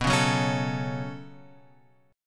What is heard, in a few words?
B 7th Suspended 2nd; B7sus2 Chord {7 4 4 6 X 5} strummed